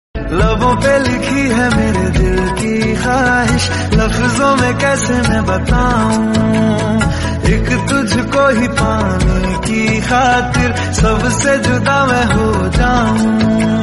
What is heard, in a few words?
Hindi Romantic Ringtone